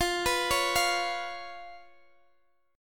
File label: B Suspended 2nd Flat 5th, F, chord